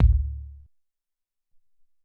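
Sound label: Hop Kick 4, Alesis SR-18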